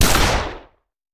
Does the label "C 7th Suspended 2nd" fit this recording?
No